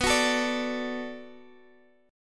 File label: B Minor 7th B, chord; Bm7 strummed